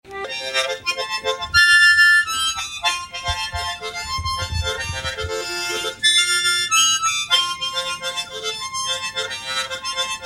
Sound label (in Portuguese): gaita